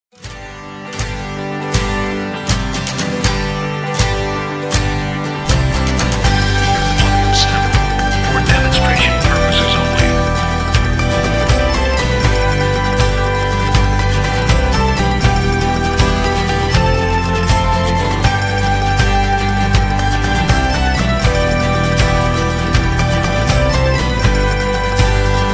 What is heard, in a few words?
ENERGETIC